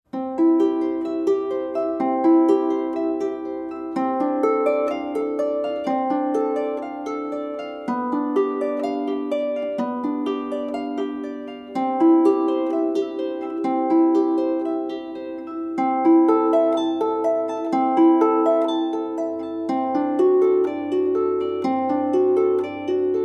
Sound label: Prelude in C